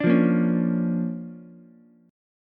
D-Minor Major 7th Sharp 5th-D-down-Ukulele; DmM7#5 strummed; JGuitar

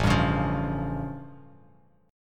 A Augmented 7th A Bass Standard; strummed; JGuitar

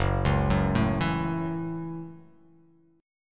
Gb Minor 7th, Gbm7 chord; arpeggiated; JGuitar

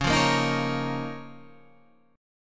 Db 6th Add 9th Db, down, Guitar Drop D

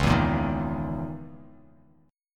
Db Minor 6th Add 9th Db down; Dbm6add9 strummed; JGuitar

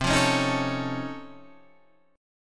Db Augmented Major 9th, C

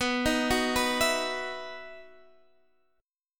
E Major 7th Suspended 2nd

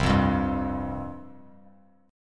C Suspended 4th, chord, Bass Standard; Csus4 strummed; JGuitar

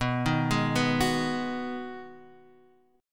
B 6th B down, chord; arpeggiated

